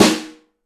nice snare drum d sharp key, acoustic snare tuned to the D# note, Loudest frequency: 1859Hz